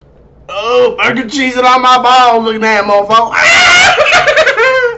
funny laught

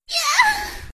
yughh; among us role

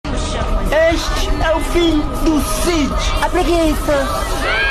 aaaaaaaaa

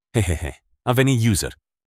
hehehe

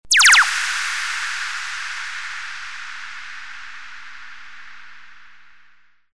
stinger

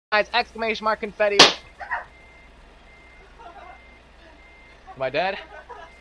sub goal achieved bang

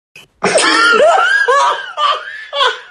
haha

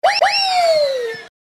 weeeyyyy

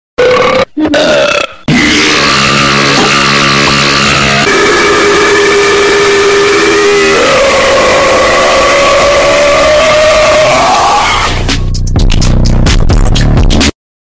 tiny burp

